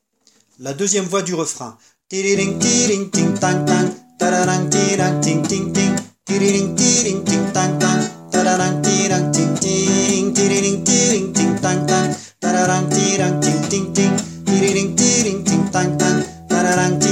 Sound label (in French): voix